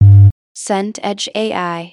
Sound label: error deny